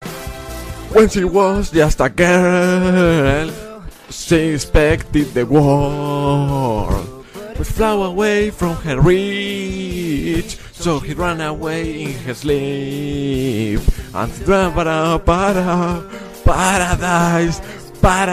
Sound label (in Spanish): cantando; willyrex